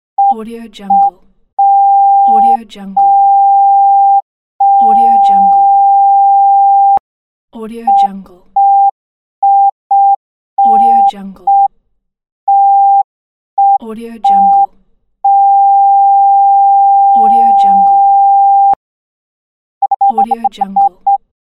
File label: censor beeps; ssalt